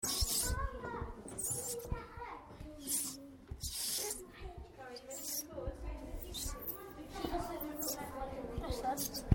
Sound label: squeaky bannister, fingers